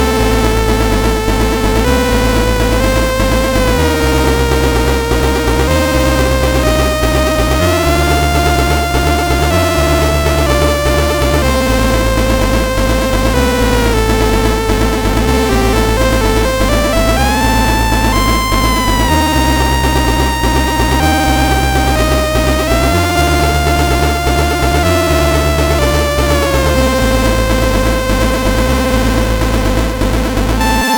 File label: Hallway sid CSG8580R5